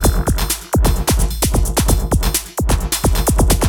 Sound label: Electro Break